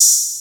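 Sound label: Open Hat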